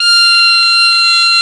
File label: BP MID F3; USB Soundscan vol.42 - Celtic Flavours; AKAI